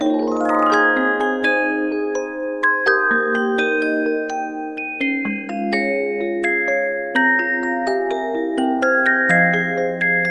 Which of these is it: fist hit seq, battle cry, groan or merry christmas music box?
merry christmas music box